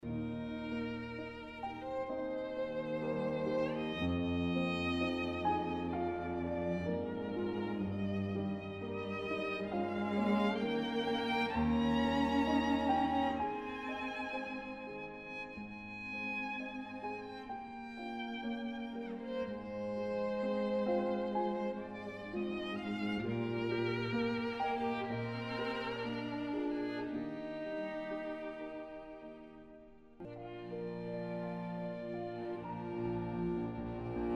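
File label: piano trio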